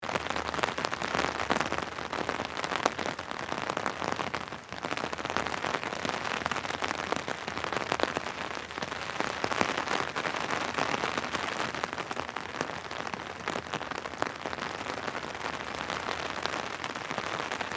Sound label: Light rain on umbrella underneath perspective loop